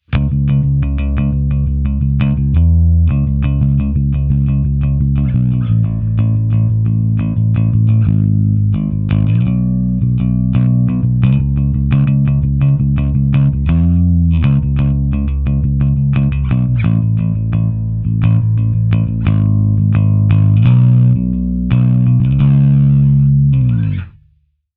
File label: Alpha Distortion Rolled Off Sterling DarkRay